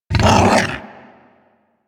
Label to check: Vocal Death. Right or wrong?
Right